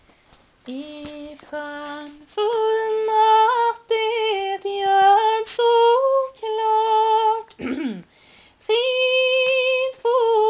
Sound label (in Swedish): Frid på jord melodi